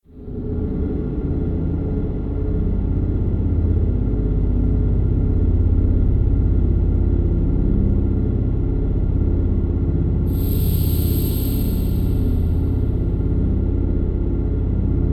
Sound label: Space Amb Motion Mix